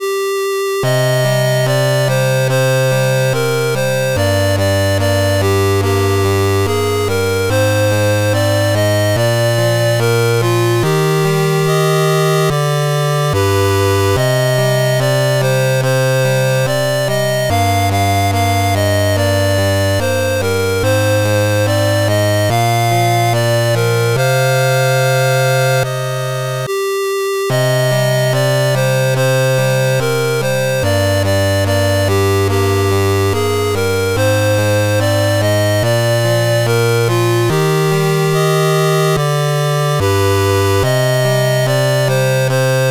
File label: 8bit